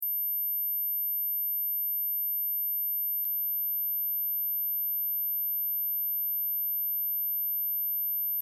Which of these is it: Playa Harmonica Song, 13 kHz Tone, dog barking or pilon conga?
13 kHz Tone